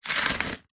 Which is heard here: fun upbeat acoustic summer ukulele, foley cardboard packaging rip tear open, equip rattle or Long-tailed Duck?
foley cardboard packaging rip tear open